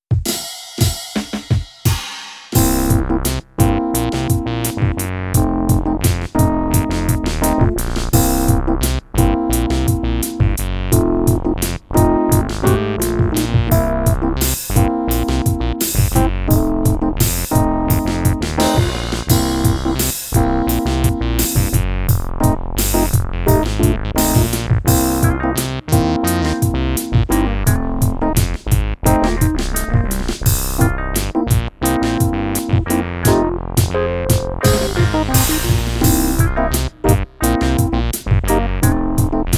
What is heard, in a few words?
Funk Jam